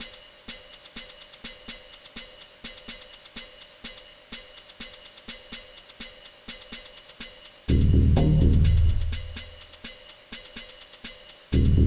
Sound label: sneaky